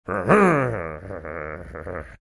mimicking sound cars engine revving

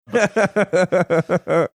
chuckling

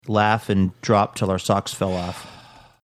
laugh drop

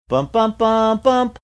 singing bum bum bum bump

